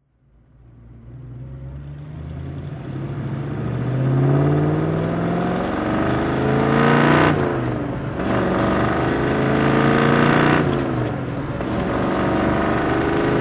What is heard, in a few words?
corvette 4speed driving